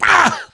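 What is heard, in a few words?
pain sharp, Russian; Demoman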